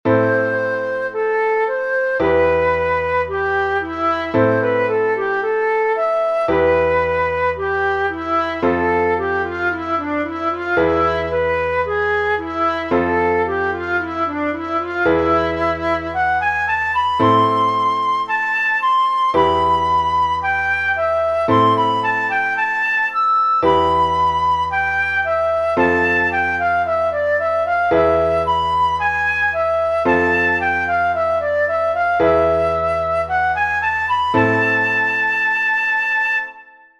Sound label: Russian Folk